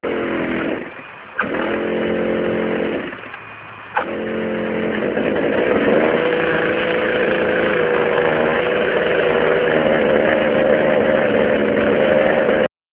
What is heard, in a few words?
motor